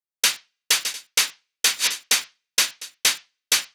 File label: Hihat Off 128 bpm; Loops; Drums; Top; Highroller; Ableton Live 12 Lite; Core Library